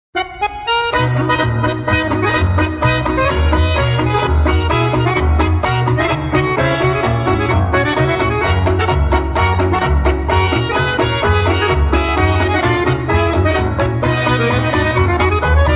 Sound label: Tick Tock Polka, accordions